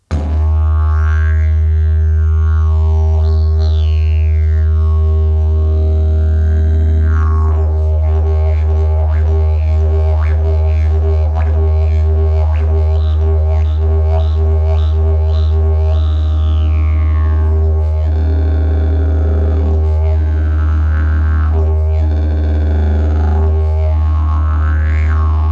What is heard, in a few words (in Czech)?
jilm, didgeridoo